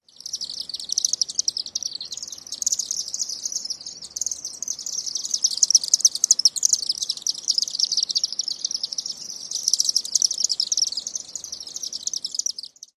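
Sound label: Chimney Swift huge flock at chimney roost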